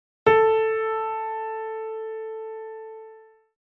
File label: a440 hz piano